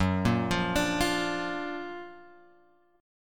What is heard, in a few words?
F sharp Augmented Major 7th F sharp; F#+M7 Chord {2 1 3 X 3 1} arpeggiated